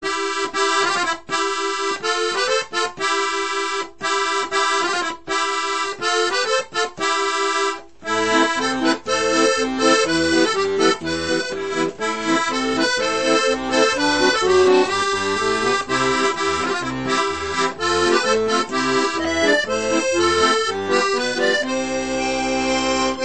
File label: Accordion